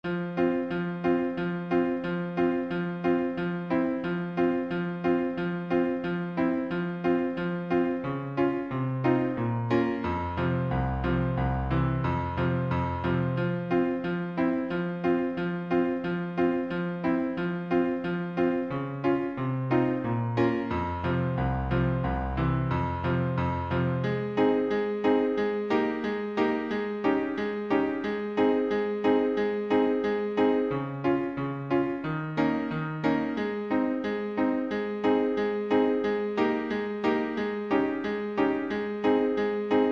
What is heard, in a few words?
moment musical accomp